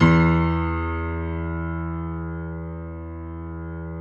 E1 YAMAHA, GRAND; NS GRAND

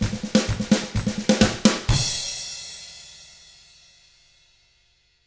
127 Drums

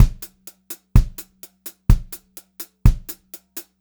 126 HH, Pop-Rock Drumloops

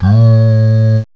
DOOO, HUMANS; M8; CMI Factory

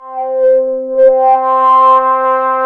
SYN Ana; Best Service Gigapack 1

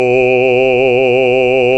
BASS, Roland

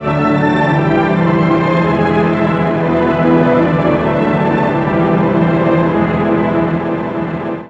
BAC, MUSICMOTIVES

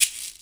gourd; Greytsounds; EXOTIC PERC

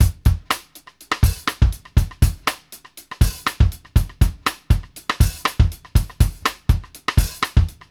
X STICK FUN; Sampleheads; New York City Drumworks VOL-1; KD JBEAT 120

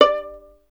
STR VLN, Solo Instruments; Miroslav Vitous

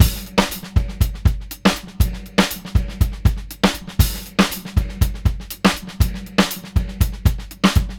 Beat Loops; Zero-G; Monster Beats

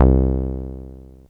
SOFT C2; Best Service Alpha Dance vol.2; SOFT SEQ.1

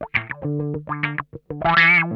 OUCH, Funk Guitar; Zero G - Funk Guitar

